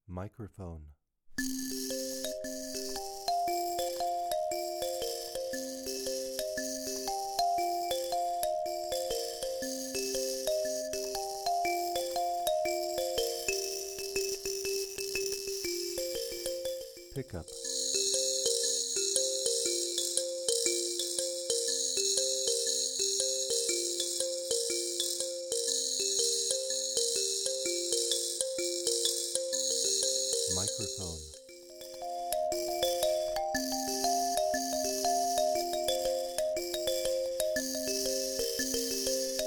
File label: Lotus Buzz